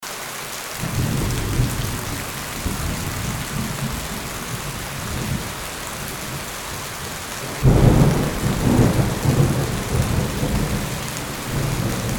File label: HEAVY RAIN & THUNDER